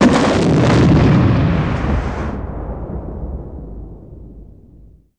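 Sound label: combat explode bwing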